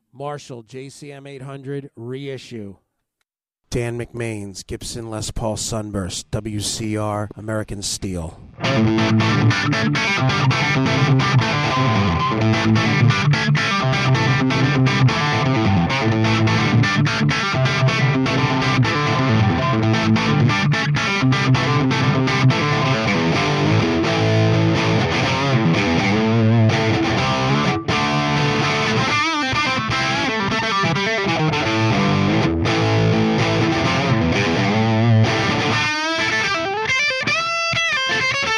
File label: marshall jcm800 re issue bridge